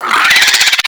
Infested Biped Attack; Warframe

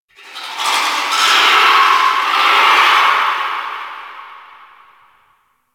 Ice Critter Distant; Warframe; Corpus Ice Planet

